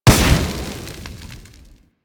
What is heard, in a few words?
Bullet Jump Puncture; Parkour; Mods